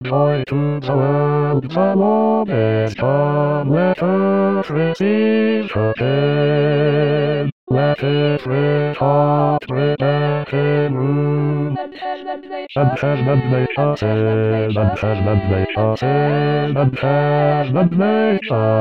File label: Joy To The World BASS